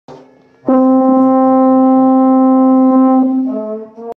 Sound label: Sousaphone